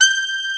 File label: SAX G5, SYNTHESIZER LEAD